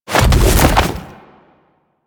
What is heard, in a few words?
Infested Splitter Jump Launch; Warframe; Grey Strain